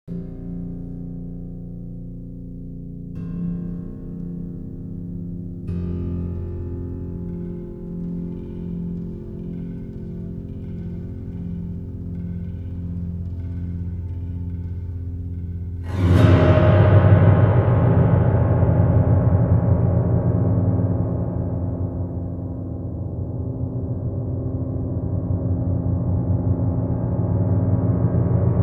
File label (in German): Klav gliss Drums Horror